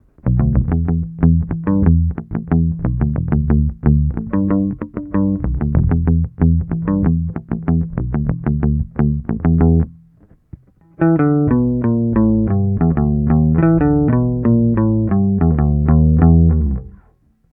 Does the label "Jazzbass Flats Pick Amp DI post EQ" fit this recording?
Yes